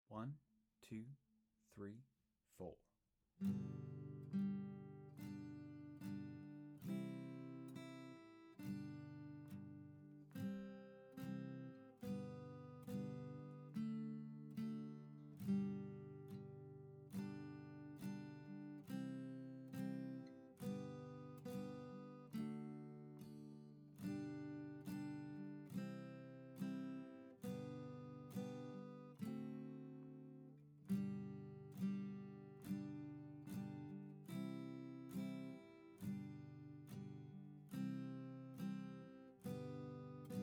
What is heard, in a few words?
Melody, guitar; Mel Bay